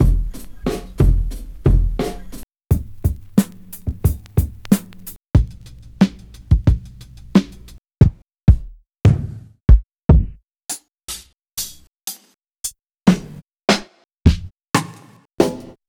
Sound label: Drum Kit Old Skool; Nuttkase